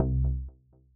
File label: Sample Bass C3